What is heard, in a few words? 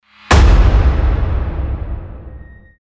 woosh